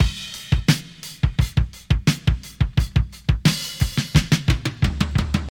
88 bpm breakbeat sample A key, kick tuned to the A note